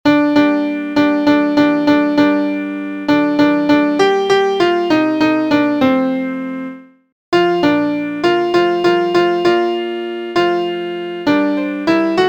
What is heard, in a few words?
Piano; Allez par toute la terre